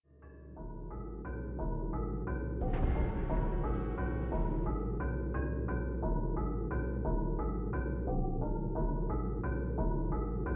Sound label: ambience music nether; PhoenixCraft 1.16.5